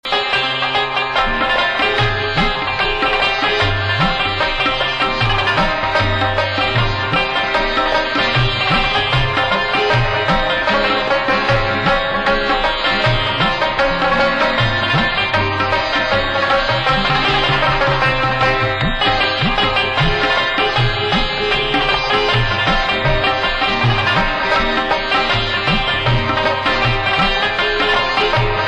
Pashto

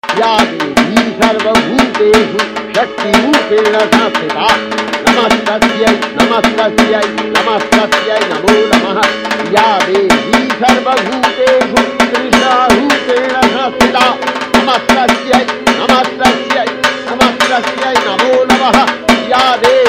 Dhak Remix

